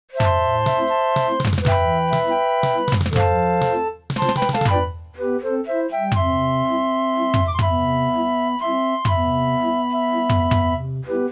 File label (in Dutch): waltz, draaiorgels